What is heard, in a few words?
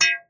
hit; warfork cc0